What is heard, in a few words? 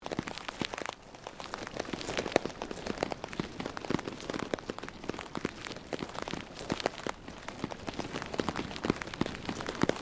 Created Ambience Sandwich Maker Loop, Artificial Sound Effects; Various Ambience created through sound manipulation in FMOD